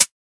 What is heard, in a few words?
clear hat sound sample B key, closed high hat sound tuned to the B note